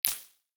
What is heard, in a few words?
simulated impulse responses; amt